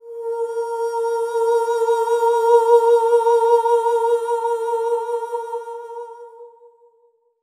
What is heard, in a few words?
SOP X2 A#4, Symphony of Voices; Spectrasonics